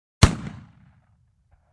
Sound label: Single Machine Gun Shot